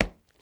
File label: boots cement; Apotheorasis